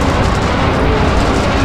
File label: Door Large Metal Loop; SFX Kit; Doors